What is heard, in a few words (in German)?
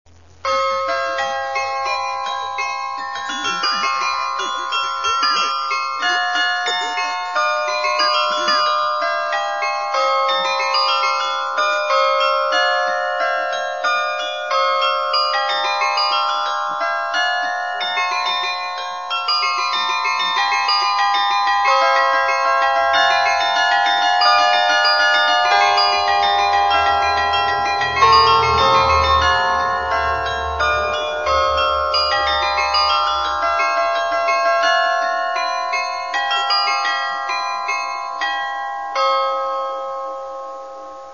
Floetenuhr; st magni